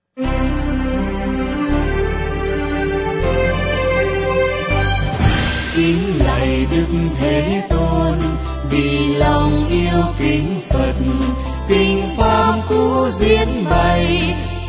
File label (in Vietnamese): Hop ca; Loi ket